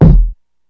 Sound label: Kicks